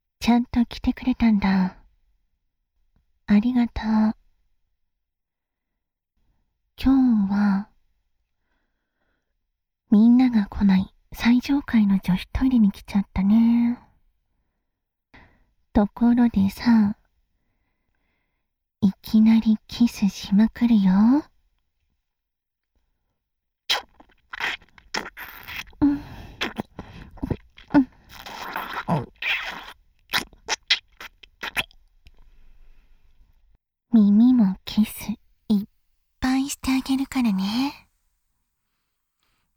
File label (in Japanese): ディープキス、耳キス; 最上階の女子トイレで; お尻突き出し誘いバック